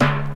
classic reggae tom drum sound d key, Royality free tom single hit tuned to the D note.